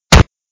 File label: block